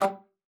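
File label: G3, bass flute; Xsample VOL-2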